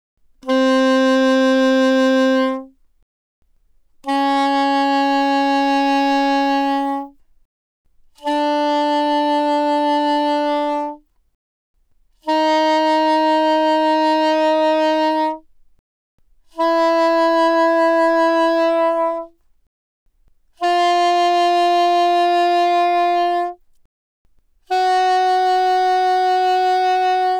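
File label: Soprano Sax Vibrato ff C4 B4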